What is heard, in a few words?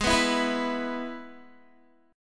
Ab Minor Sharp 5th Ab down Guitar; Abm#5; strummed